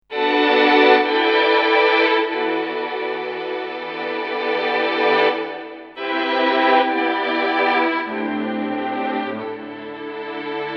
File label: Violins Tape